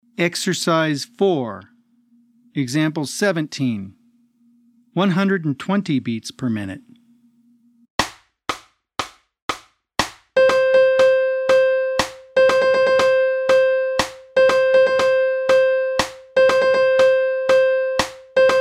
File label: 120 BPM